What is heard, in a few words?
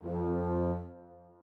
strings